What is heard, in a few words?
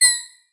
Single UI Beep